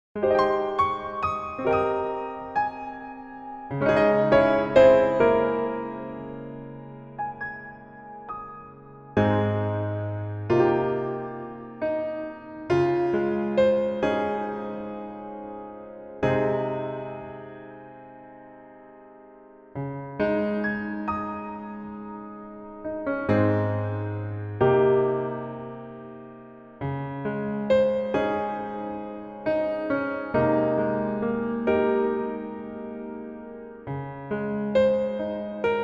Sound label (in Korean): 여자키, Piano MR